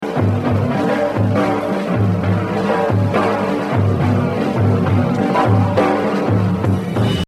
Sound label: Exercise, jazz drumming; Kenny Clarke; The Evolution of Jazz Drumming (Gottlieb)